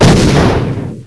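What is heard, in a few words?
explosion; gusanos promode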